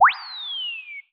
Enemy Rarebumpo Escape

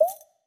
Move

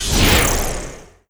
Enemy Sandwall Wall Appear

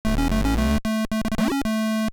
GAME START; Food Fight; Atari 7800

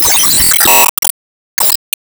Weaverwall Appear

